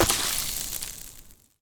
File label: explosion acid; Epic Toon FX